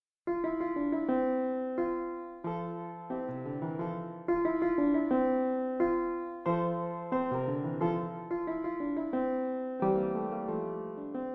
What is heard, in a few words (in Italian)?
Menuetto per pianoforte Un poco Allegro; Schimittbaur